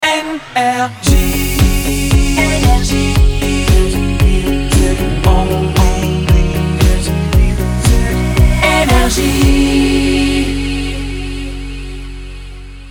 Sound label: jingle; NRJ